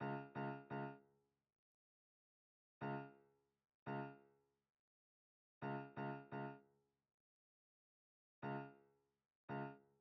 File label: 171 C sharp Minor Keys Low piano